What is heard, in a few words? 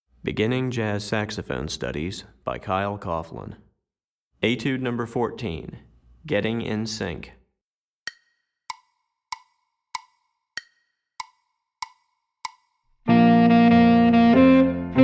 Tenor Etude, Saxophone; Etude #14; Syncopation; Beginning Jazz Saxophone Studies for Tenor, Volume 2